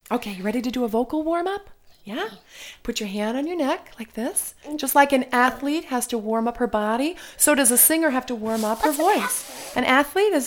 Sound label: vocal warmup